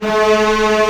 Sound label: STR D#1 FF; Sound On Sound; Peter Siedleczek's Orchestra